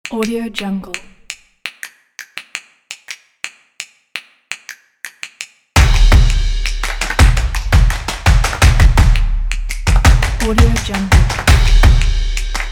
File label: this is drumming